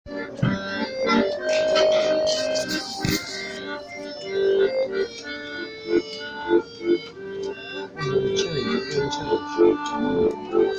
greek acordian busker

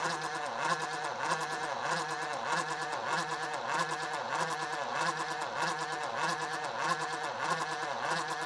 hard drive making this noise

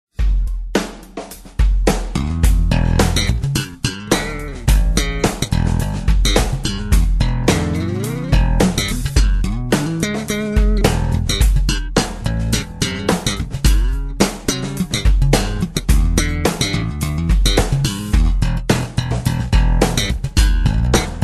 funky slap bass practice

